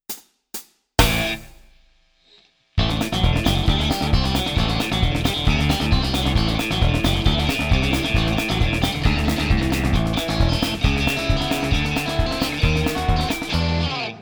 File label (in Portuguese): Playback Vinheta; Dica do Minuto